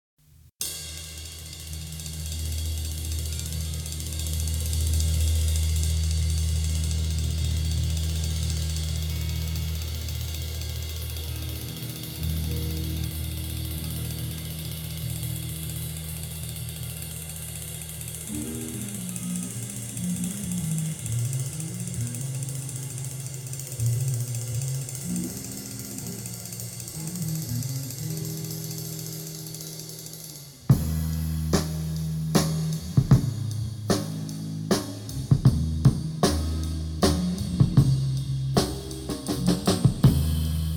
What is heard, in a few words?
drums